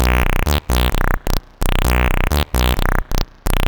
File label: Synths, Underground UK House & Electro; Sonic Foundry (Sony Creative Software)